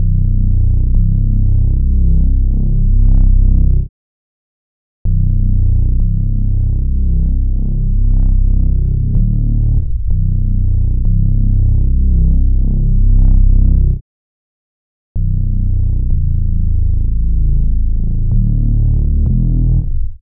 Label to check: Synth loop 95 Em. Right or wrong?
Right